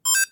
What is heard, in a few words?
T1000E Toggle Sound Off; SenseCAP T1000-E; meshcore companion